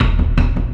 level, scavenge, music; Left 4 Dead 2

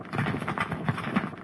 building rubble, streetwar; ambient, levels

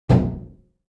Mech Metal; Sid Meier's Civilization 4 Complete; Beyond the Sword; Units

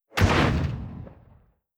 light cruiser attack; Hearts of Iron IV Death or Dishonor

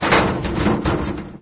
metal rattle scaffold; random amb sfx

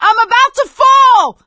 ledge hang end; Left 4 Dead 2; producer

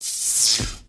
Mech Spark; Sid Meier's Civilization 4 Complete; Beyond the Sword; Units

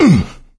hurt minor, survivor voice; Left 4 Dead 2; coach

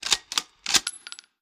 infantry rifle cartridge; Hearts of Iron IV Death or Dishonor

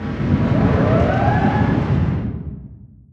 distance heavy cruiser damaged attacked loop; Hearts of Iron IV Death or Dishonor

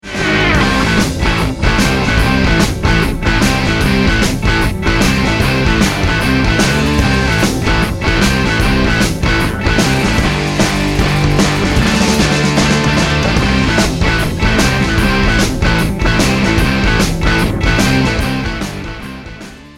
choose team, music

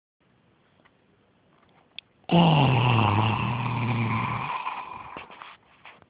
Zombie sound

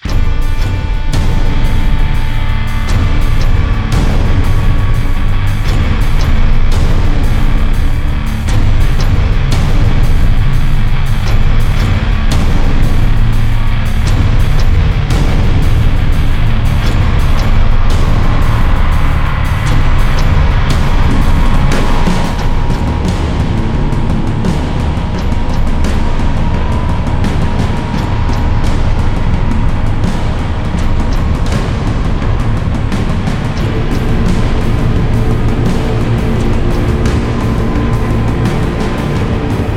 Boss Fight; Torchlight II

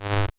switch; KSP; Romfarer

